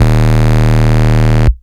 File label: Root D#, Bass